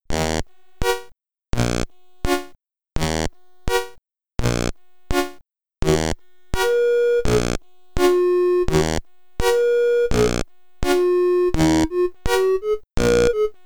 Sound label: Trolls (SID Stereo); Hobbit; Commodore 64